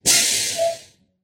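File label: school bus brakes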